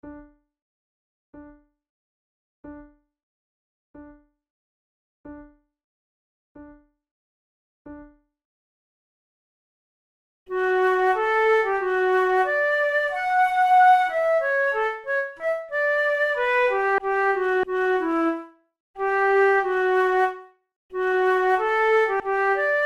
duet, flute

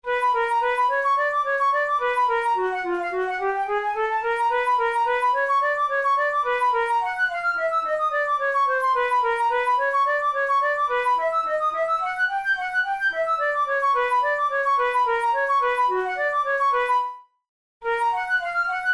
caprice etudes, flute

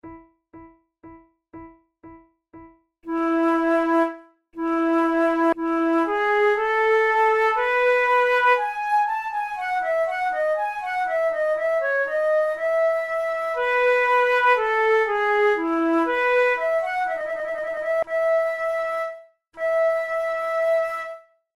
prelude, flute

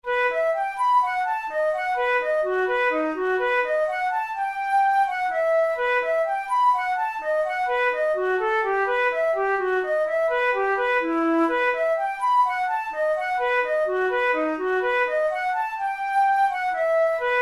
braun pieces sans basse no3 minuetto, flute

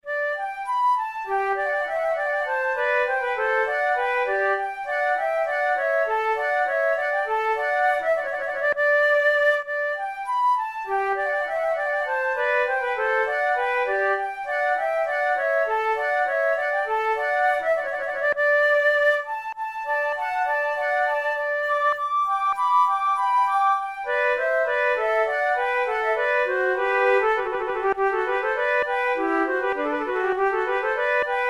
allemande, flute

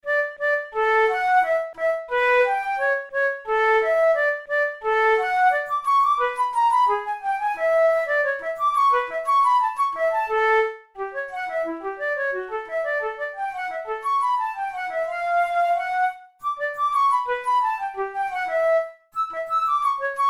studies for the boehm flute; drouet 72

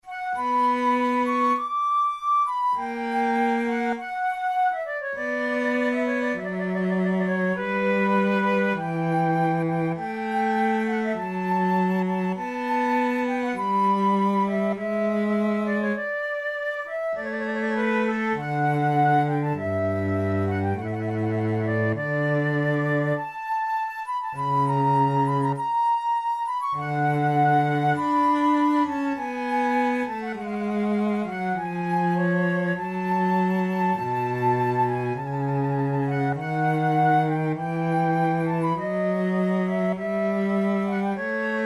adagio, flute

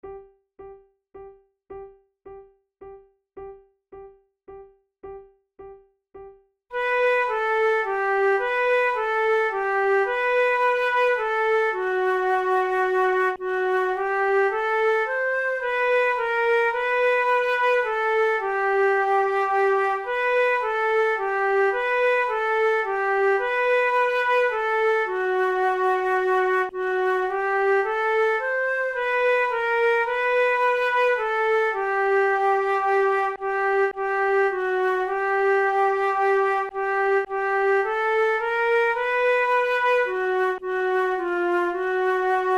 duet

